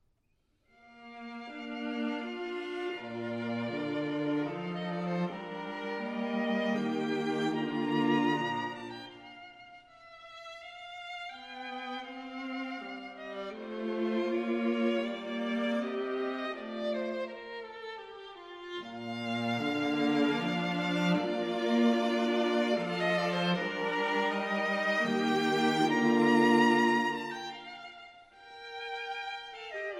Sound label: Quintet For Horn, Violin, 2 Violas and Cello in E-Flat Andante